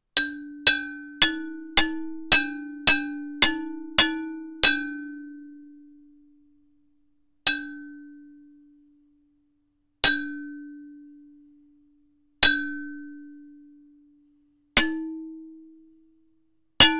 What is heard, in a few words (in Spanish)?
vidriofono